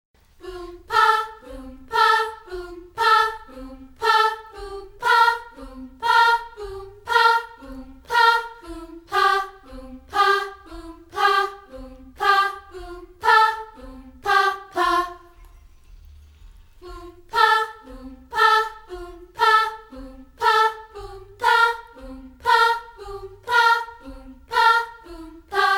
Boompah Pah

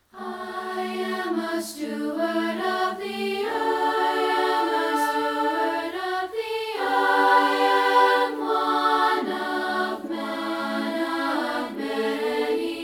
Stewards A Cappella

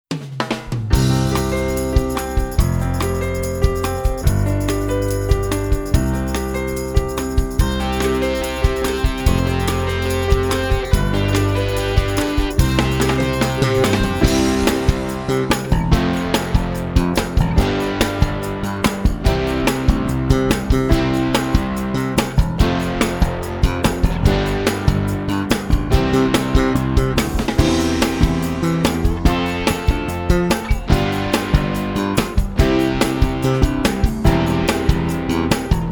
Step Stop Slow

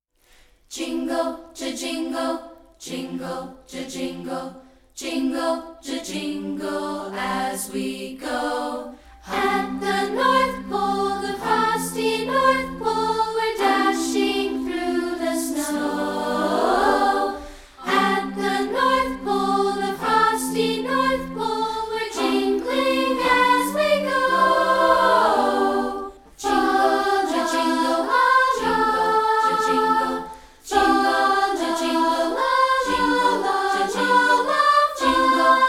Jingle As We Go A Cappella

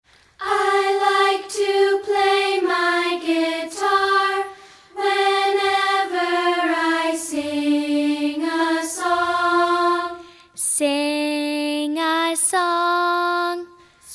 Strumming Singing

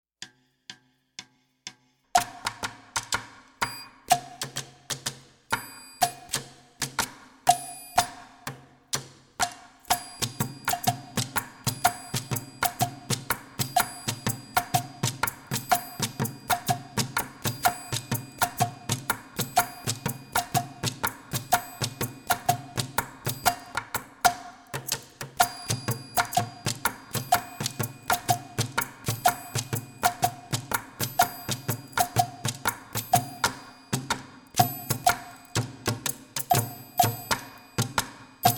Student Percussion Fast

